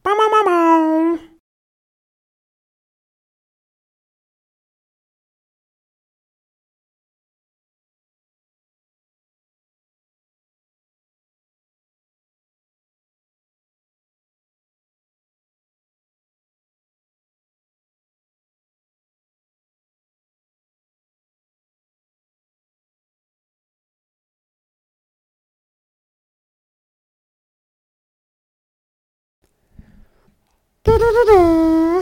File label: loser horn entrance